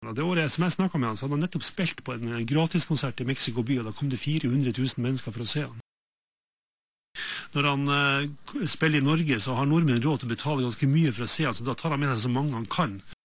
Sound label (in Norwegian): Konsert; Se en konsert